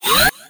Pullout Ectoball; Nicktoons Nitro; arcade

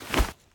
Player Grab Start

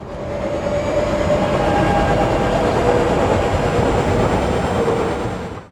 Train Start

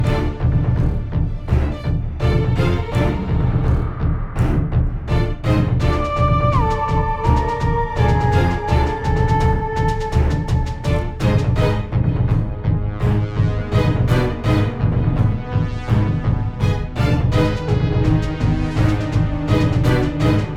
Invasion Loop; Space Tyrant

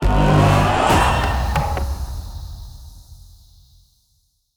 Se Ui Picking Legendary Weapon; Metal Unit

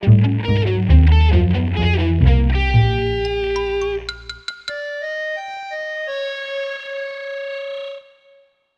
Bari Sax

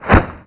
Whoosh

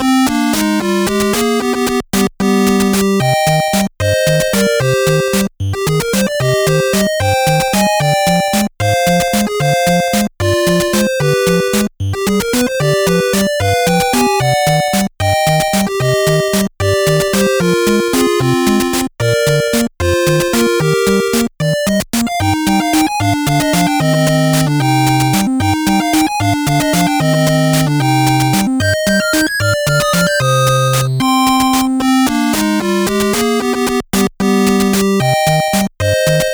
Classic Underwater; The Fourth One 2019

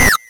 Shooting; puchicon; dsi ds

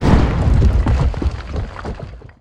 ceiling break; false knight

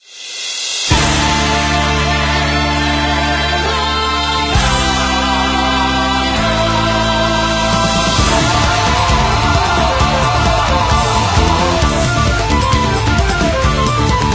Battle loop; Drago Noka; PS4; kannagi

